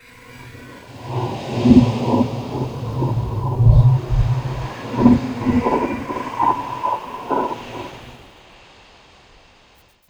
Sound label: WAVES, Water; USB Soundscan vol.33; AKAI; Disjointed Textures